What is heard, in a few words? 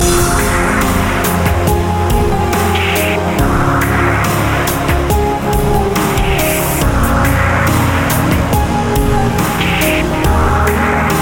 Melody